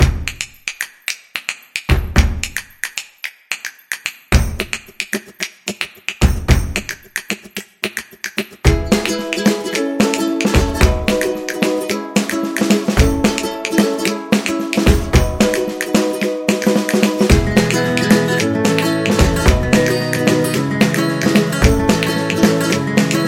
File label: Cheerful and Happy, happy music